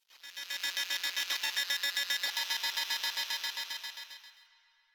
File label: Computer Room Ambient Beeping